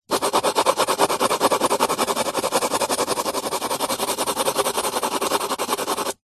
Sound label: office watercolour pencil colouring in shading paper